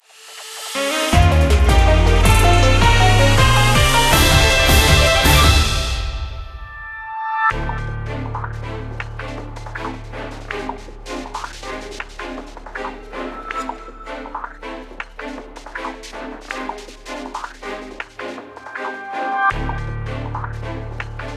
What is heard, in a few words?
Victory Jingle 160 4-4; Battle Suit Aces